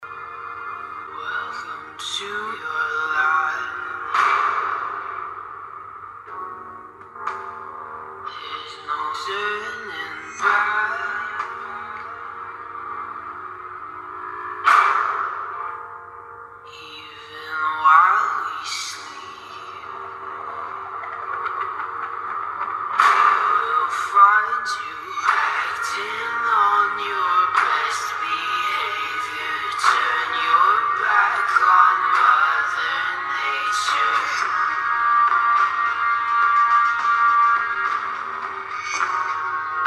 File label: speakers test sample; GIGABYTE G5 K5F